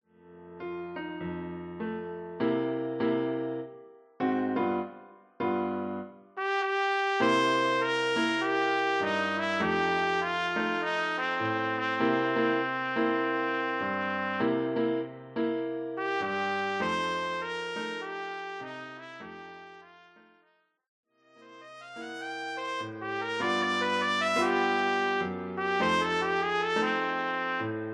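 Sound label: trumpet; solo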